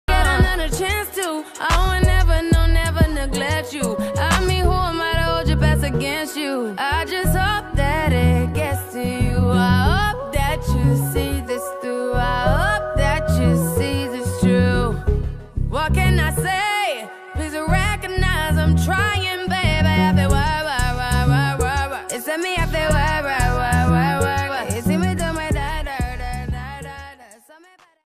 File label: female english ringtone